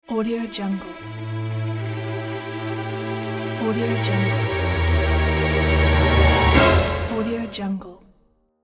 a terrifying surprise ident

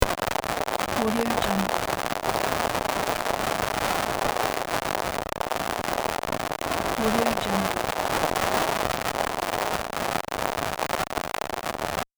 digital glitch

